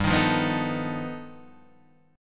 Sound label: G Augmented 9th, Guitar, Standard; G+9 strummed; JGuitar